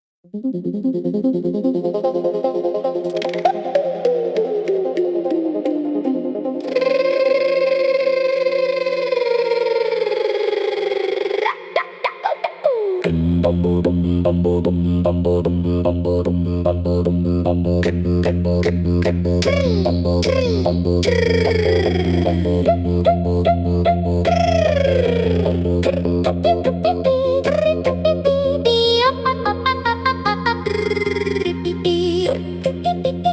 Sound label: forest psytrance